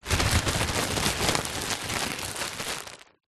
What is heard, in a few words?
plastic bag roll up by hand; foley